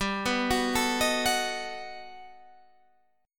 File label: G Augmented Major 9th, Guitar, Half Step Down